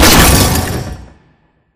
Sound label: Battery Crate Explo Metal Crack; Titanfall 2